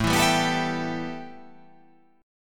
A 7th Suspended 2nd

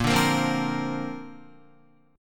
Bb Major Add 9th, Guitar; 4E,4A,5D,5G,5C,6F; strummed; 6,5,8,5,5,5

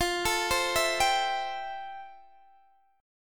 F Major 9th Guitar DADGAD

